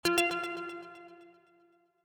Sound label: SMS Alert; Daniel Simon; iLinux